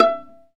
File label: STR VLA PI, Chamber Pizz, String Master Series; Roland - String Master Series